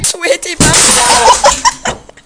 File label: television break